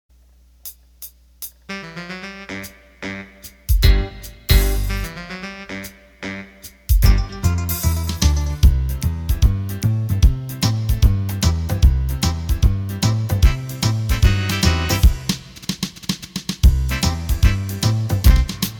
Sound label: Shuffle, accordion